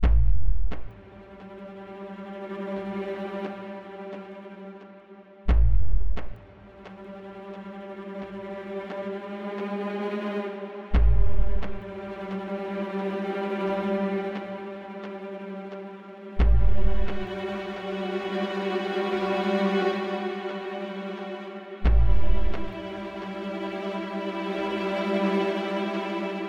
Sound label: Music Deck Enter Vent; Syndrome